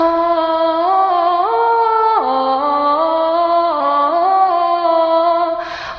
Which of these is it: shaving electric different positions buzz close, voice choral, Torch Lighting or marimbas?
voice choral